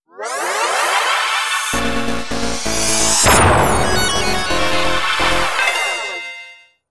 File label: Titlecard; Dead Ops Arcade 2; Call of Duty Zombies; Treyarch